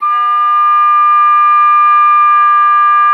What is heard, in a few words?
OBOE3; Xsample VOL-6